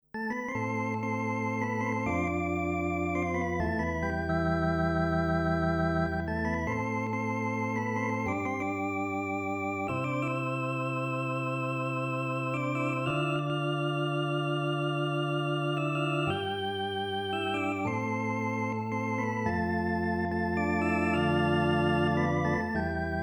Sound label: organ